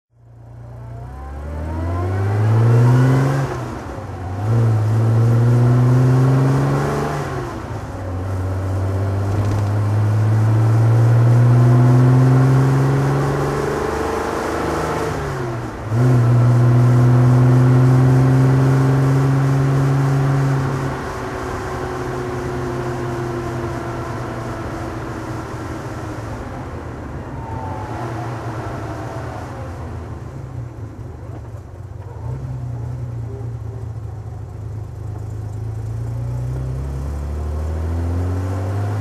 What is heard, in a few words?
austin healey 3000 mkIII driving